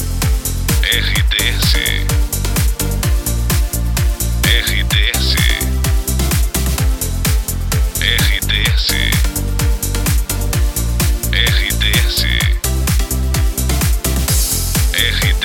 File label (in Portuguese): LOOP TRILHA PARA LOCUÇÃO; vinhetas cantadas